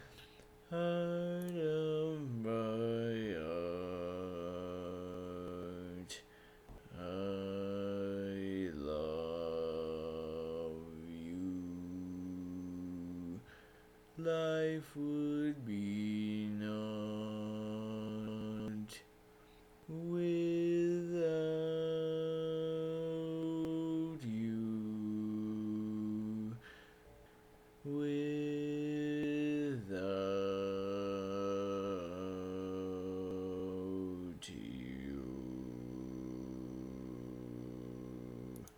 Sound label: Bass, barbershop